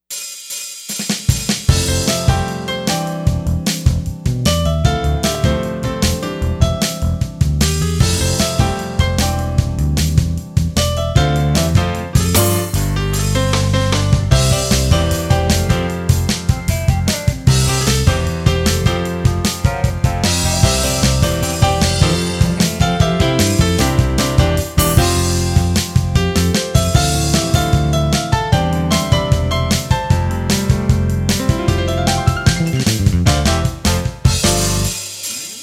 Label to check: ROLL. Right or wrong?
Wrong